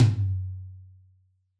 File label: Yamaha DJX 2B, Room Tom